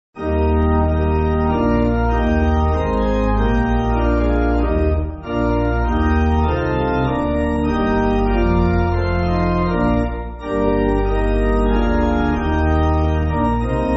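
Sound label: Pipe Organ, church backing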